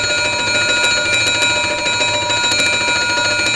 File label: fire bell